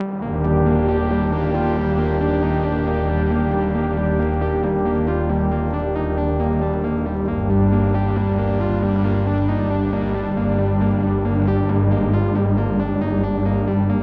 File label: City Ruins Stem; Epitasis